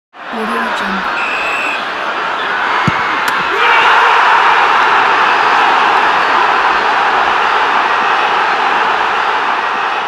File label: soccer game sound pack